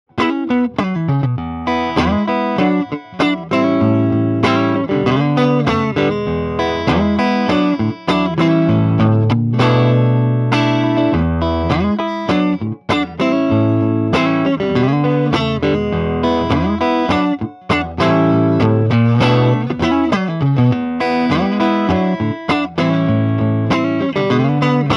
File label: Rocker Tele Clean Blues